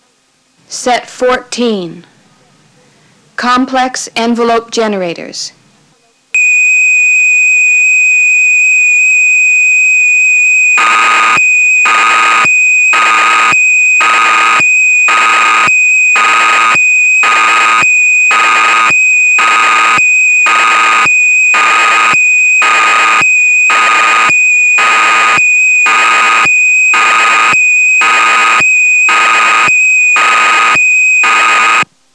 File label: Complex Envelope Generators, dx9